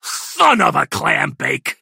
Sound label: die